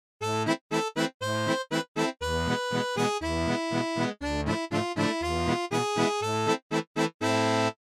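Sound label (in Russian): успокаивающий и расслабляющий ритм аккордеонного фонового звучания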